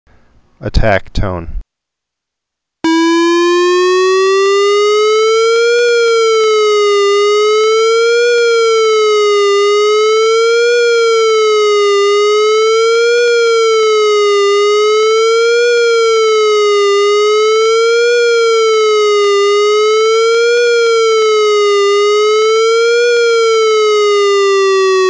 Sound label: Attack tone; OEM; highlandsborough